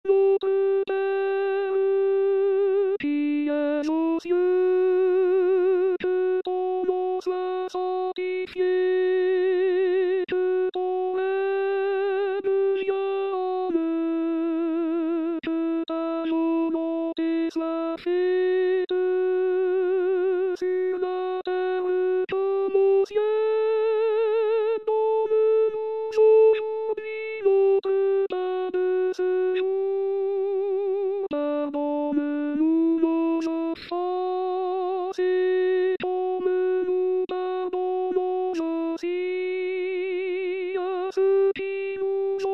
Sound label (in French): notre pere alto; R. Korsakov